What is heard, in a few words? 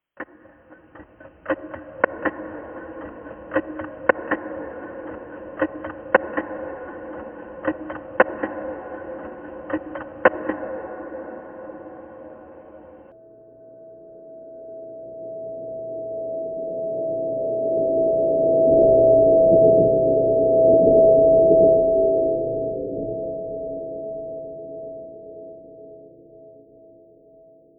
Horror Timer